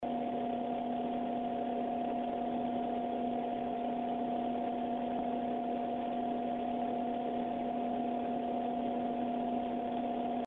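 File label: asus silent square evo, hi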